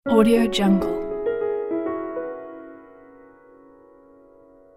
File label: piano intro